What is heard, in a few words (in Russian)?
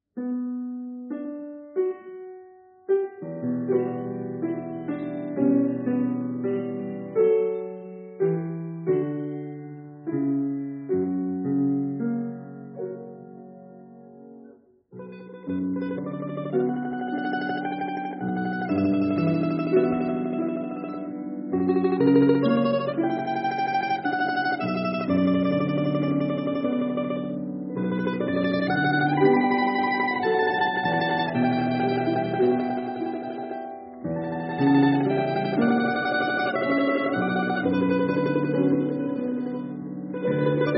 домра; рус. нар. песня; Тонкая рябина